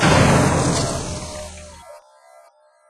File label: death; needleman